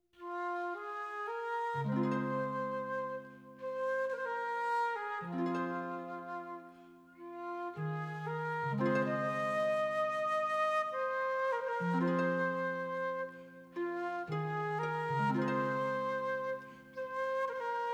Irish Air [Instrumental Reprise]

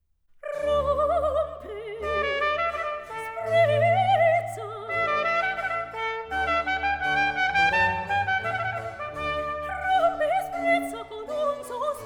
Arie con Tromba Sola; 4. Rompe Sprezza; Baroque duet

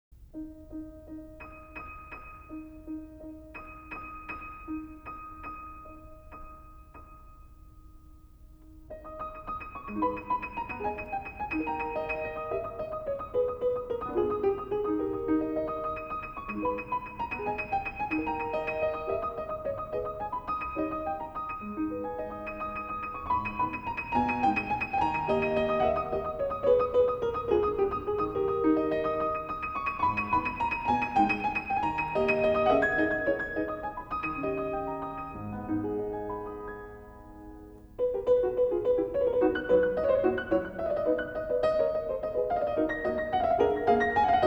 Grande Fantaisie de bravoure, for piano; sur La clochette; (after Paganini: "La Campanella")

